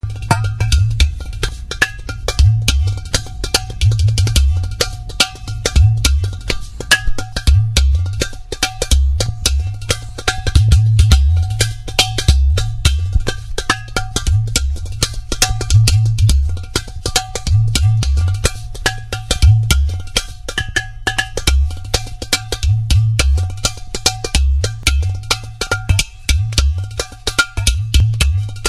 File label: gatham; Les Udu, Gatham, Potées, Cruches.